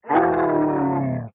hedgehag ouch; boss

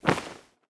cloth door

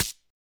hit shock light; CrossCode; battle

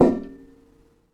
metal collision low; The Swapper

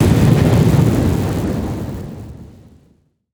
heavy thunder out; shock-boss

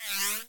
launch; World Of Goo

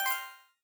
coin

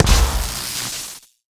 hit shock hard; CrossCode; battle

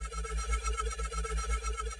train cube riding

